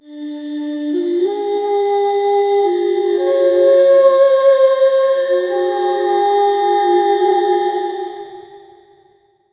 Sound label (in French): vocalise dune fillette sa; musical instruments